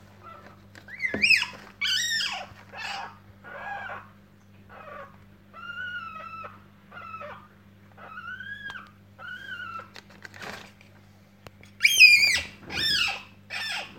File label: Grumpy woken up